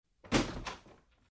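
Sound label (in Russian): защелка двери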